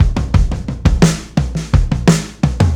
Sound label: TOM GROOVE, breaks, drums; Basement drums vol 2